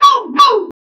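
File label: dull thud sound unsuccessful, negative feedback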